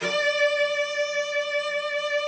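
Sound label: cello vibrato, D5; Xsample VOL-7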